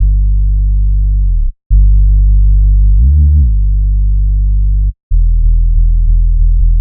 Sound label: Bpm141 Bass Loop G; Lucky; Prime Loops; Dubstep Illusions